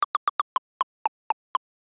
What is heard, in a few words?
tok